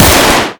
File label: realistic glock